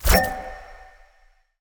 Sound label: Ice Spell Utility Detect Magic; Utility Spells; Arctic Aura